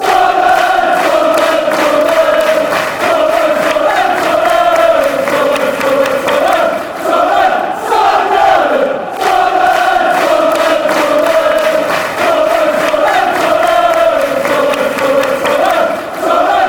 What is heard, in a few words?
sunderland sunderland